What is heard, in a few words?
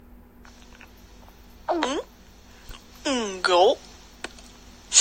gulp nervous